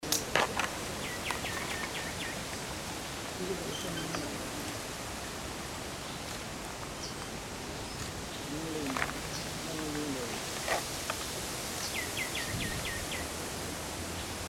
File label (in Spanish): anambé común